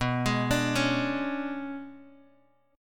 B Minor 6th Add 9th B down Bass Standard; Bm6add9 arpeggiated; JGuitar

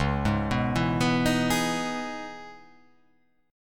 C sharp Minor 9th C sharp, chord

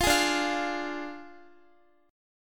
D Diminished D, Guitar; Ddim strummed; x,x,15,13,14,12

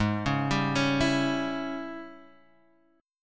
G sharp Major 7th Suspended 4th Sharp 5th, chord; x,x,x,1,1,2,3,1

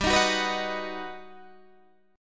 G Augmented Major 7th, Guitar, DADGAD

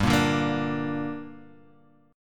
A sharp 6th G

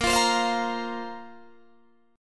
Bb Suspended 2nd Bb down Guitar DADGAD

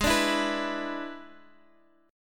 E 7th G sharp, guitar

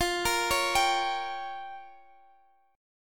G Minor 7th Flat 5th

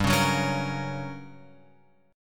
G Minor Major 7th Flat 5th, G; GmM7b5 Chord {3 4 4 6 X 6} strummed

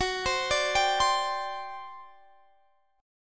G Major 7th Suspended 4th, Gb

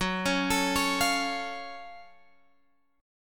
F sharp Minor Major 7th F sharp Guitar Drop D

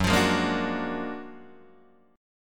F sharp Augmented Major 9th F sharp Guitar Open D

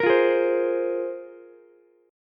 A sharp 7th Suspended 2nd, Ukulele chord; strummed; 15,17,16,15; JGuitar

